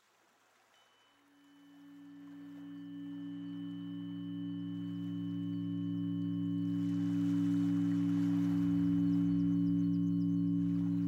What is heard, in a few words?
Breathing